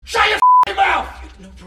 censor

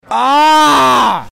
aaaggghhhhh

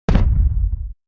muffled explosion

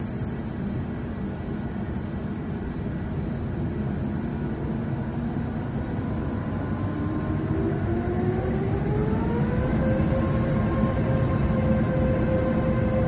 airbus a350 941 spool up

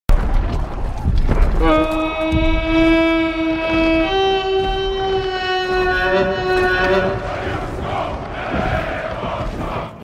horn; horn of the harad

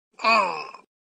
ughh; talking tom

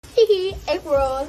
hehe

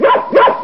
bark

